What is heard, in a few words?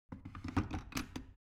object kitchen plastic water boiler place soft